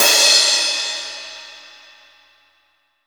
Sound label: Crash Cymbal, MONO CRASH